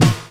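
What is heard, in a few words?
snare drum bass drum, Dance Mega Drums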